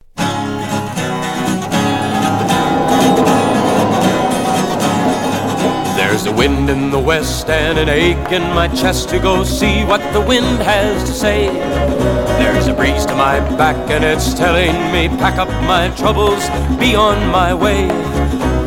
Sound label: Western Wind